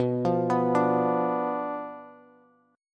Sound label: B Diminished B down Bass; Bdim arpeggiated; JGuitar